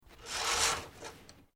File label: curtain window small open, Household Curtains; Living Room